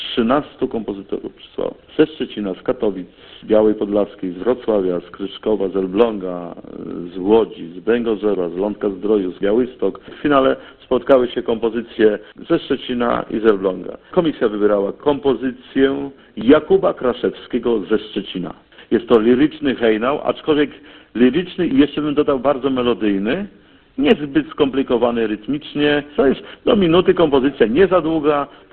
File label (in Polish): hejnał